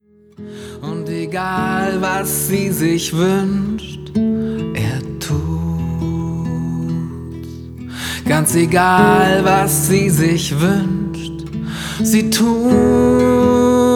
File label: UNPLUGGED